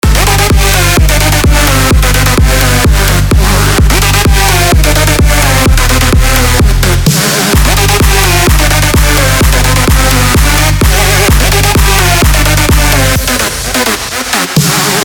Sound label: dry wet